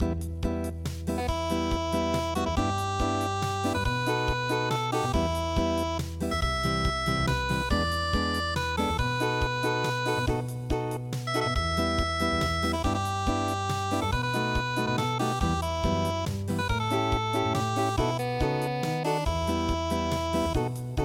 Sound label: The House of the Rising Sun unpluged